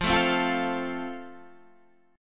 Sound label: F Major, guitar chord